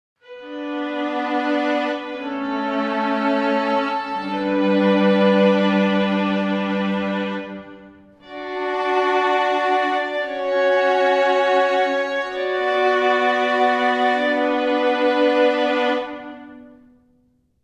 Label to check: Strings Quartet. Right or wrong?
Right